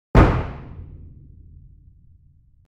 Mahler hammer single

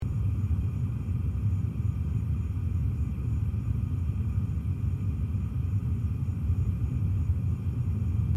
Gas stove burner flame loop; cooking

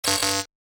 Shopping store checkout scanner error; shopping sound effects

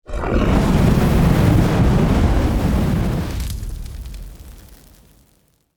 Dragon fire breath long

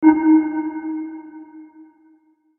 Submarine sonar ping scan single beep

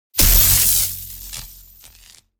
Electrical energy Impact explosion burst; shockwave

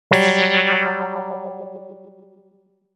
Anime fail accent

